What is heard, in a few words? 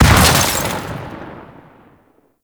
Micro Death Explosion; Warframe; Corpus; Arachnoid Micro